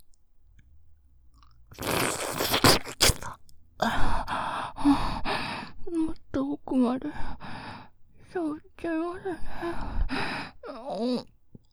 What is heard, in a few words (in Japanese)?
ぢゅぱぁっはぁはぁ～